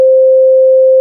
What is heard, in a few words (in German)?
Ton C2